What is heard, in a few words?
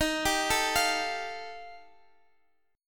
G 7th Suspended 2nd Sharp 5th D sharp